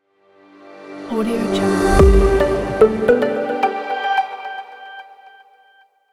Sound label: minimal logo